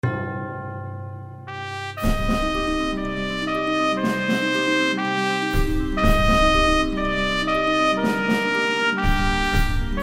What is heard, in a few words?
Defeat; Men of War